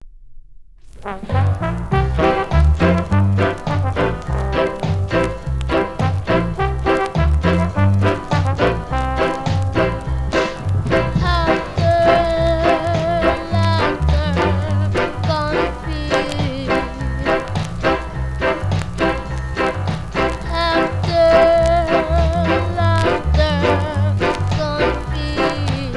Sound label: laugh